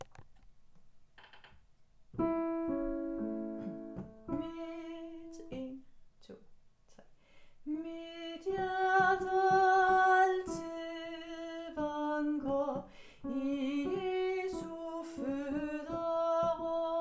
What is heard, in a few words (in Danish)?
ALT med sang